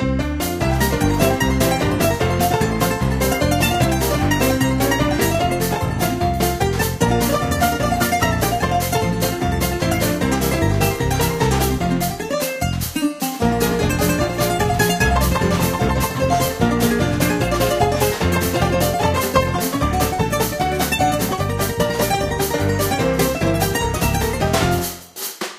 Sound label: Background Banjo; Ghost 1.0